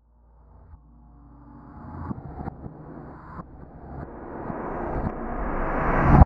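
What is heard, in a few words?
reverse, Cinematic Metal Impacts; Pot Developer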